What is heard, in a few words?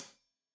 Hat Tight, Hi-Hat; Deeply Sampled Drums